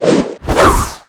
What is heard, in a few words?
leap